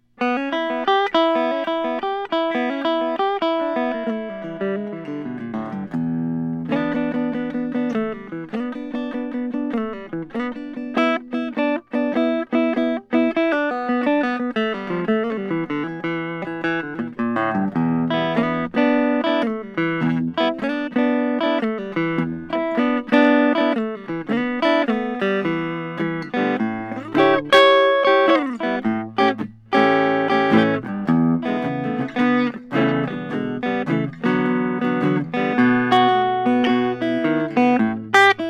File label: collings city limits jazz sunburst